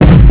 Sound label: explos; tempest